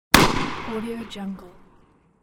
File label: petard